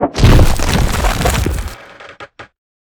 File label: Dodge Kraken